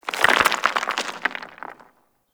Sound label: Rock Slide Medium; Warframe; Eidolon Plains; Ambience